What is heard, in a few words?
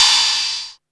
SPLASH, CYMBALS; 90 s Sample CDs; Back In Time Records; Big Bang